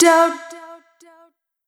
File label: Single Voice Melodic Loops; Pocket Diva World Class Vocal Samples